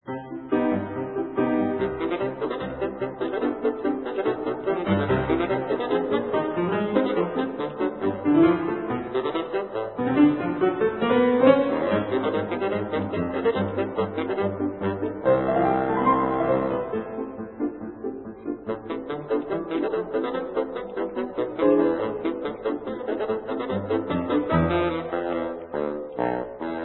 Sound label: polka, bassoon